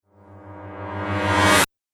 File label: Magnetic Magic, magic sound effect; Role Playing Game; Andrew Fleming